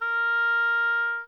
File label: oboe mf A#4